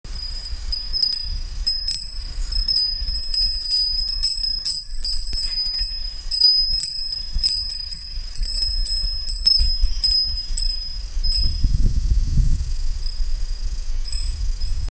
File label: wind chimes sound, temple